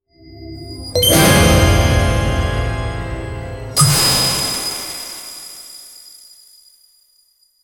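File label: casino reward chest opening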